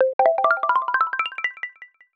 Juniper, ringtones

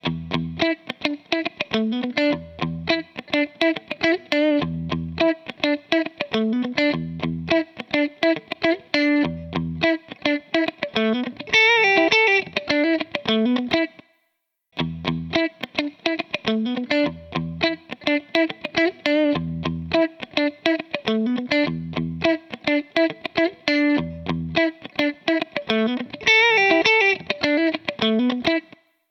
Guitar Tone, ringtones; LG X5 2018

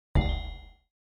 message, notifications; K-Touch; Nibiru-H1

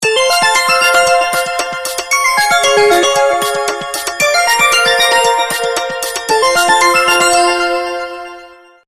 Crystal rain, ringtones; Sony Ericsson Z770i

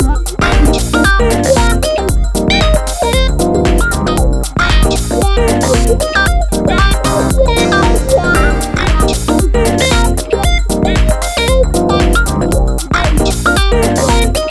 Frisky Bouncy Bendy; Sony; Xperia Movie Creator Music

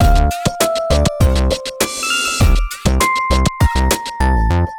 ringtone, hiphop rnb; Google; NewWave Labs

